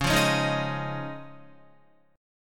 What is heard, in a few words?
Db Major Add 9th, Guitar; strummed; JGuitar